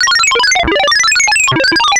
COMPUTER; E-MU Formula 4000 Series Vol. 3; Analog Odyssey

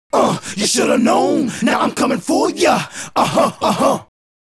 Male Group, Vocal; Sonic Foundry (Sony Creative Software); Vocal Toolbox

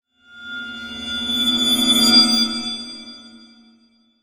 Waterphone Shine; Sonic Foundry (Sony Creative Software); The Electronic Music Manuscript Collection

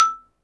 XYLOPHONE, Percussion; Synclavier; World & Orchestral; ILIO

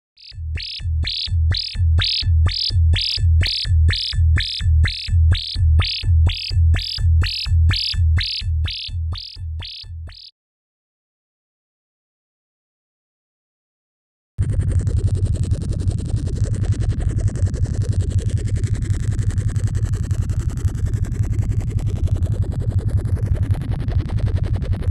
EMS Seq, Rhythmic; Klaus Schulze Collection

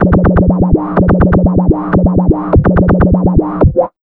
125 DRM, Fresh Disco House

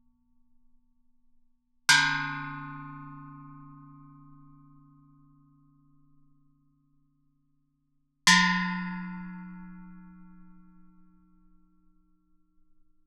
Gamelan; Propeller Island - Complete Gamelan

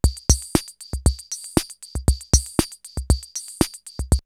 CR78 BeatBox, drumloops, DrumMachines

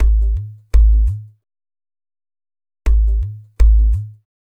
SWC LOWAVEDR; Spectrasonics

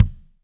BRASH KICK, JAZZ

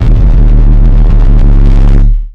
D 808

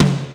GATE TOM L, DRUMS; East West - Global Sounds

